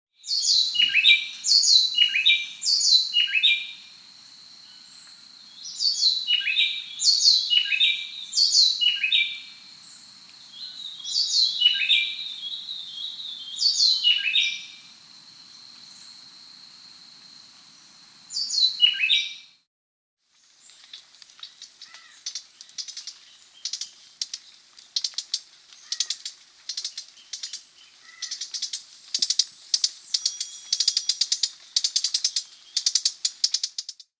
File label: Gray-Breasted Wood-Wren (Henicorhina Leucophrys), Andean Birds; S. America